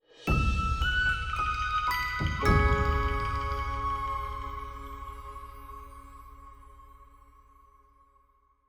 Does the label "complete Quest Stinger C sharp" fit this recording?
Yes